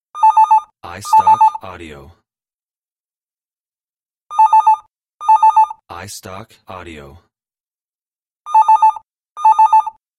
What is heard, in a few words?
Phone Ring